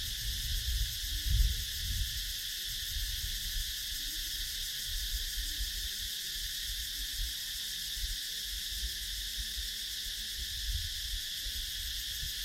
Vientiane cigales laotiennes; Laos

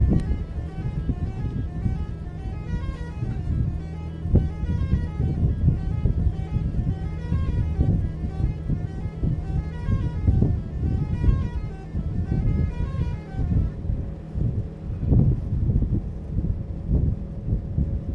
klaxon bus musical; Inde

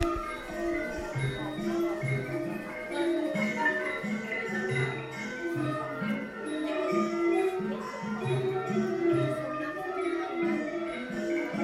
Laos musique dans un temple à Luang Prabang

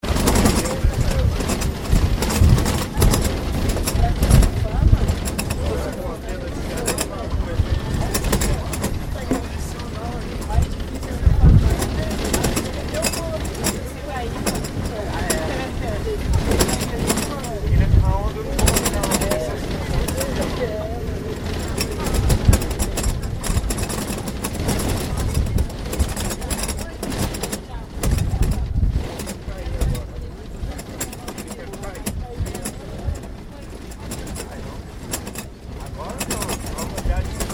En bus vers Paraty Mirim; Bresil

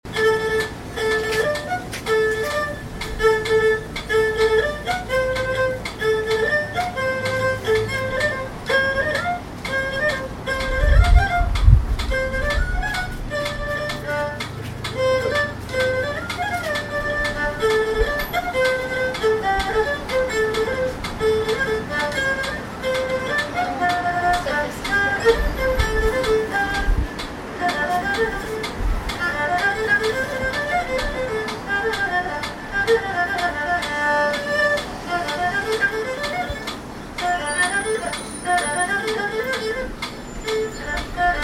Beijing Musique dans un bus; Chine

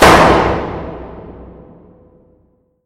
claquement de porte; France

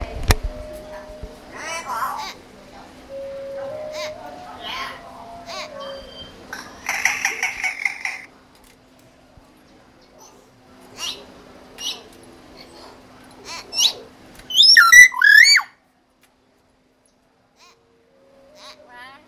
Chine Xian mainate

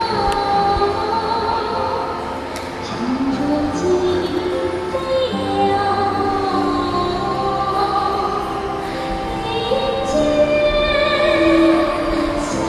Singapour chanson chinoise

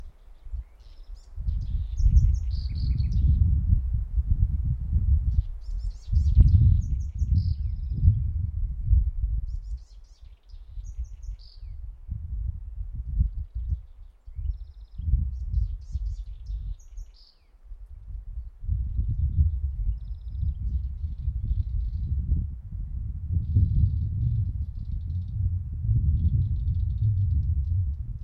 Chili Altos de Lircay oiseaux

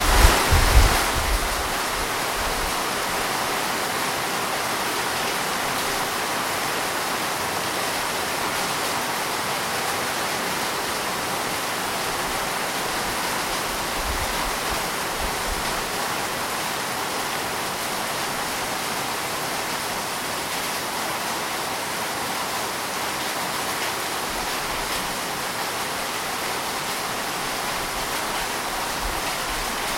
Indonésie Jogja pluie

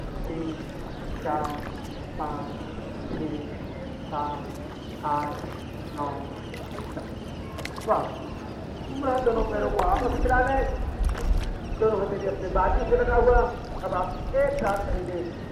sur le gange à Vanarasi; Inde

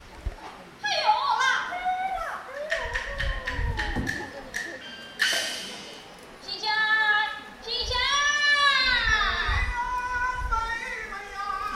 Chengdu spectacle théâtre, Chine

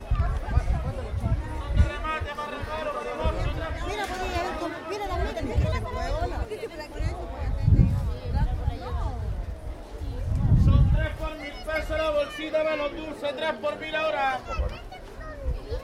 Chili Santiago dans la rue

